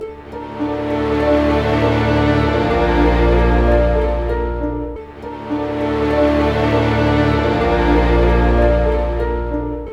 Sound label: ORC DOOM; East West; Scoring Tools; Doom's Day